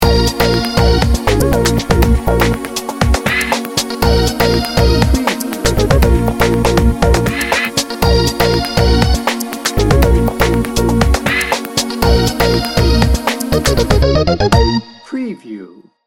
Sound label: urban electro